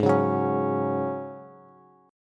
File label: Ab 7th Suspended 4th, Bass, Ab7sus4 chord; strummed